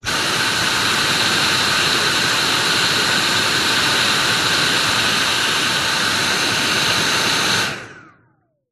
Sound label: household vacuum cleaner stick suction burst close to end of open hose; dyson v7